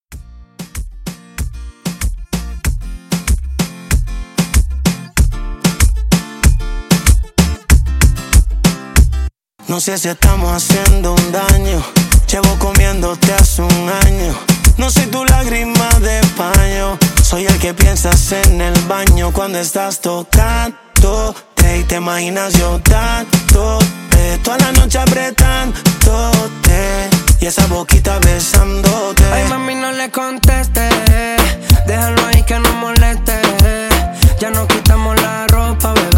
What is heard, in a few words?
Club Latin Reggaeton 95